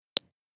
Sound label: scale